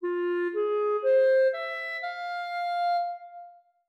5 notes F min

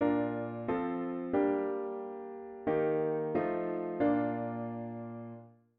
chord prog chunks diat inv and V7

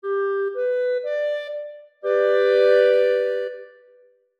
triad Major G4

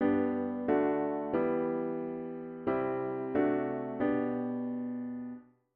chord prog chunks diat inv and V7 inv

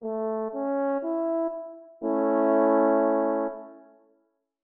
triad Major A3

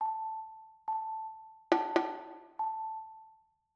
rhythm simple 1 beat

